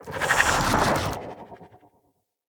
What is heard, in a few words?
Staff Caster Proj Whizz By; Weapons; Player; Staves; Wazzard Staff Caster